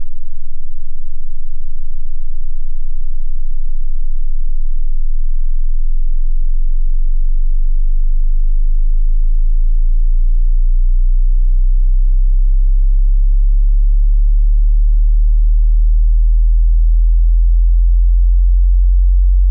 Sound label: sweep 10-120Hz linear; m6dBFS; 60s; 48k; stereo